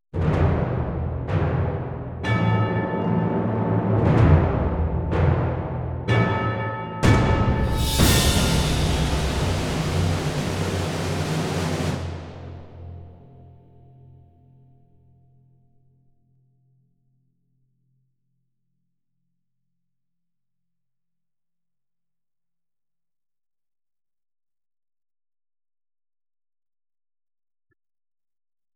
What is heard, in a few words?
percussion only